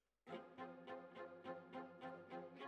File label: Violas, jazz for piano